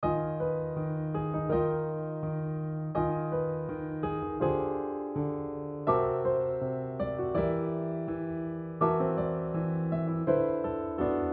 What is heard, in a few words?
famous chords